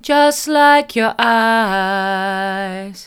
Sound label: mid, vocal